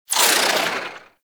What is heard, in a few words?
Rifle Projectile Tail; Weapons; Warframe; Corpus; Ruban